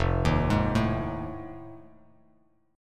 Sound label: F 7th Sharp 9th, Bass Standard; F7#9 arpeggiated